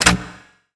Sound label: switchbox pull; mechanics; Spearhead Demo; MacFormat 2003 June